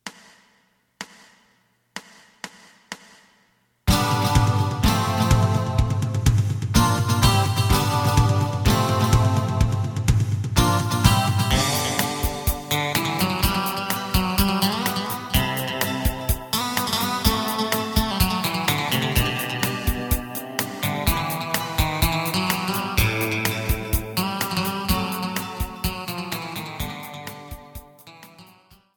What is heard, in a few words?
bass